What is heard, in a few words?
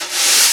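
snare Br Swirl, EMU Orbit-3 drum machine; 300 Drum Machines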